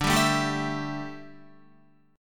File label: D Suspended 2nd Suspended 4th D down Guitar; strummed